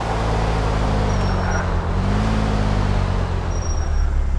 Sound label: throttle off slow, vehicles; primo